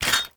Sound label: Footstep Default; Warframe; Hoplite